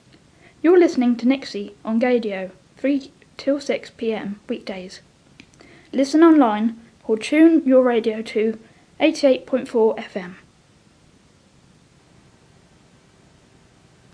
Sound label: jingle